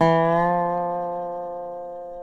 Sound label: SLIDE, Dobro Slides